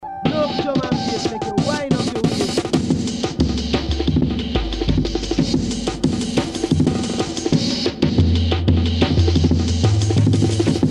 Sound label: percussive waves